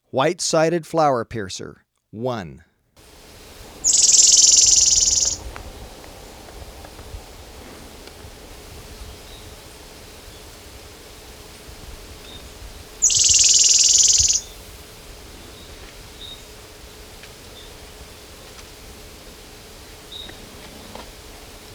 White-sided Flowerpiercer, Birds of Ecuador; S. America

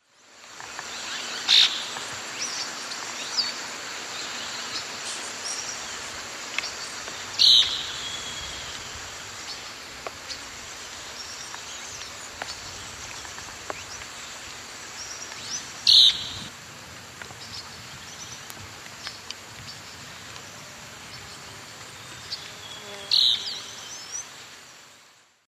Elaenia gigas, scold; S. America; Eastern Lowlands

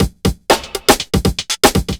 chemical 120, loops, 120bpm

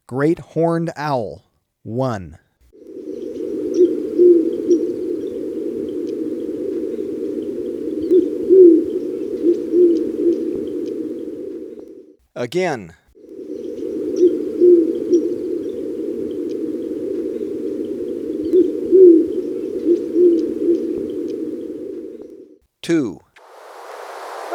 Great Horned Owl; S. America

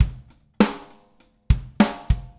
Basic Beat, Rock Drums

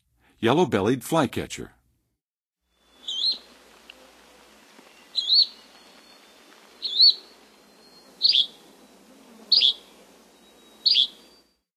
Yellow-bellied Flycatcher, Western USA Songbirds; North America

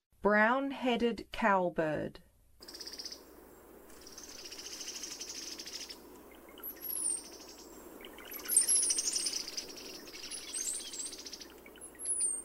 Brown-Headed Cowbird; North America

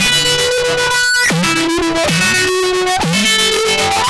Hyperfuzz, Guitars; Rock

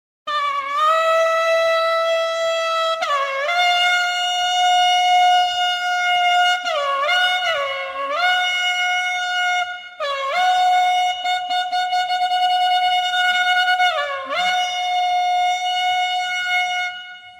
Shofar Klarinet Klezmer; World Music; Israel - Hebrew; Yiddish; Israeli

